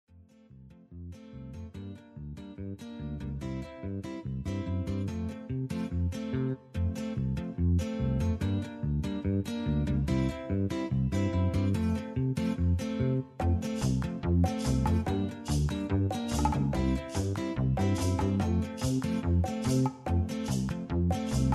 bgm

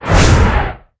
hand

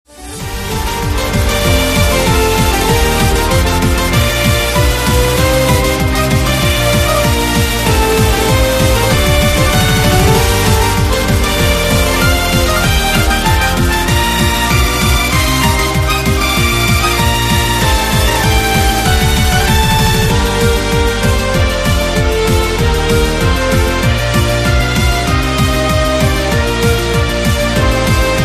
Battle

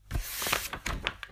open ui

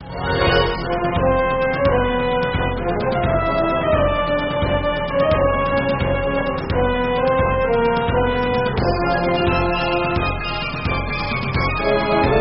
Last Boss

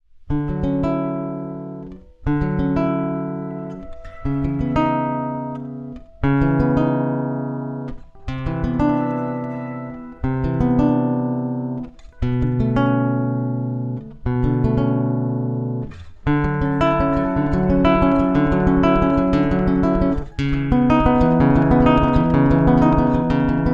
Menu

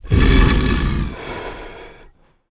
monster

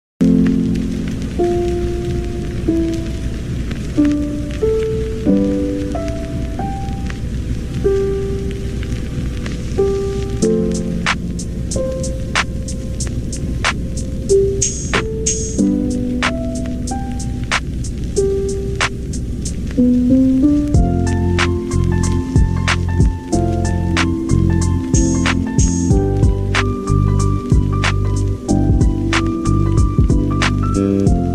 snow; minecraft

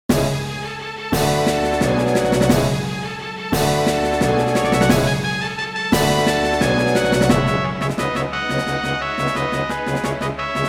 bgm

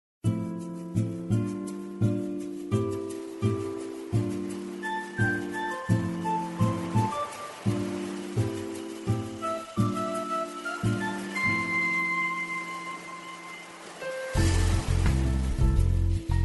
moonlight Village; dragon Saga

